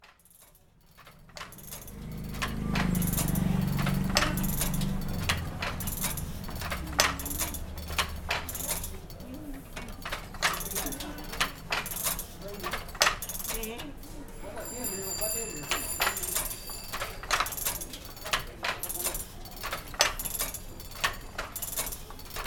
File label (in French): Tissage en Yak